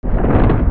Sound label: Flap; Sfx Boss Hokmuto Flap; Godzilla Smash3